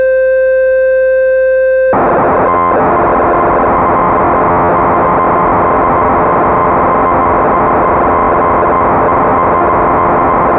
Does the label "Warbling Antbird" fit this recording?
No